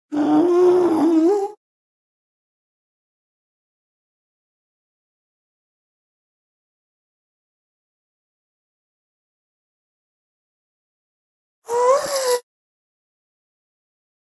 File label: ghast